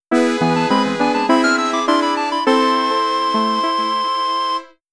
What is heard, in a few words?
Game Failure; Ohh Cruel